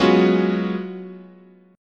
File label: F sharp major 7th suspended 2nd suspended 4th, chord